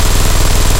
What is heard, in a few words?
loop mg42 origin, guns tanks; War Thunder